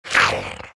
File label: snarl; Undying